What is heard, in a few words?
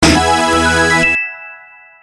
respin wild